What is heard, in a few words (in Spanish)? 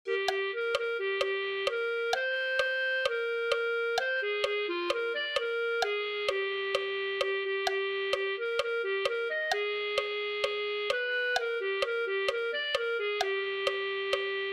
clarinete